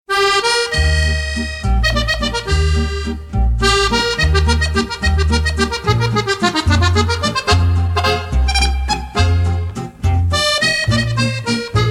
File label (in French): clavier veloute